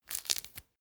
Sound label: mandarin peel single